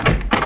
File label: door open